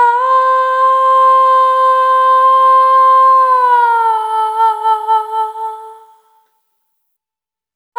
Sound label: Single Voice Melodic Loops, Vocal Samples